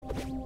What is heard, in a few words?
sound jump; celeste